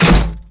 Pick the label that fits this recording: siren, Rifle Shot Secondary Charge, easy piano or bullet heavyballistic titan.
bullet heavyballistic titan